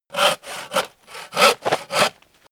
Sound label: hand saw wood